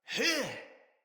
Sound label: Attack Heavy, Battle Barks; Envoy